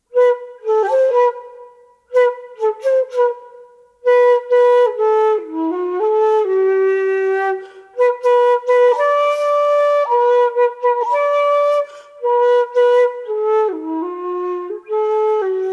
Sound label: flute